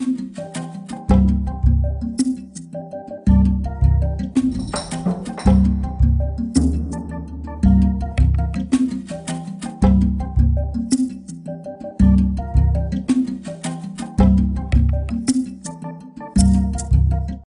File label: Jungle Level